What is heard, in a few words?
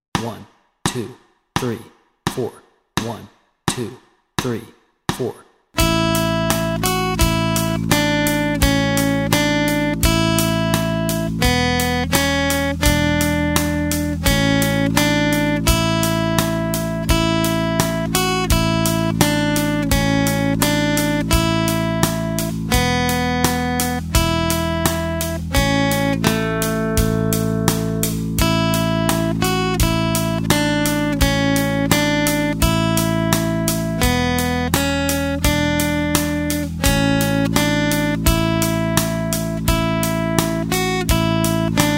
London Bridge Melody Track, guitar